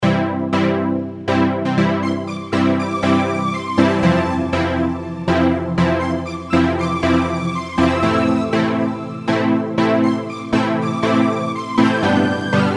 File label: Major melody C major 120 bpm